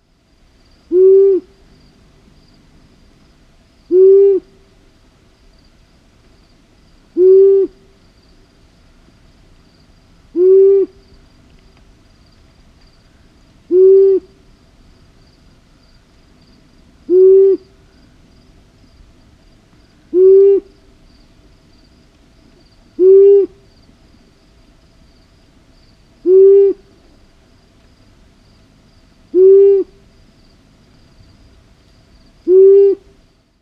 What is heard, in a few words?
long-eared owl male advertising song